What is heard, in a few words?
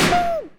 bomb explode; sweetcandymania